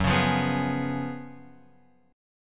F sharp Major 7th Suspended 2nd Suspended 4th F sharp, Guitar Open F; strummed; x,1,1,0,2,3